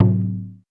Roland U220 Dry Set

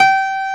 STENWAY; East West; Global Sounds

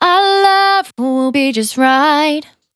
Finally 70bpm C, Velvet Vocal; Zero-G

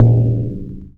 A#, Synclav FM PERCUSSION; Big Fish Audio

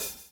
BLOW; Spectrasonics - Backbeat

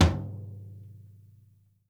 DEAD FLOOR, TOMS; SW TOMS

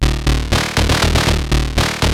SH101 LOOPS; Syntec; Wall of Sounds; TECHNO-BOX

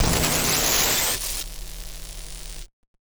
Casio, Glitched, malfunctioning synth

